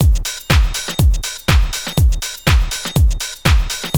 house loop

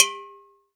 JANGKOQUI, Latin Hand Perc; E-MU Producer Series Vol. 2; More Studio Essentials; Composer

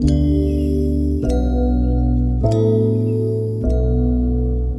Gong Cycles; Sonic Foundry (Sony Creative Software) - Friendly Fire; Gongs

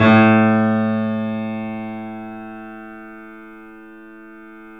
A -R, The Piano; InVision Interactive - The Piano

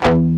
DIST DAMP E0, DIST GUIT

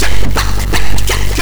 VOX FX; Big Beat Samples & More

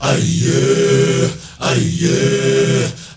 MALE, HUMMERS

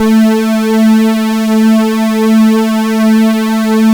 X TRA A4, X TRA SYN

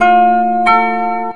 KOTO LOOP

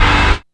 PWR GTR LO

